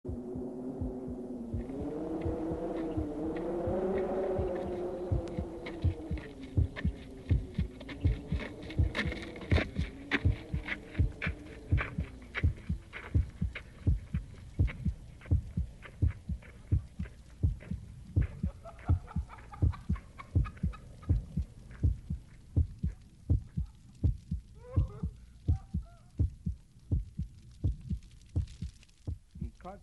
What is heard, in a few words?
footsteps; Golden Record